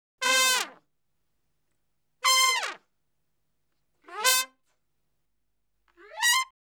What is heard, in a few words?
Salsa Picante; Masterbits; World Zone VOL-3